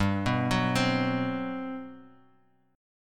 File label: G Major 11th, Guitar Half Step Down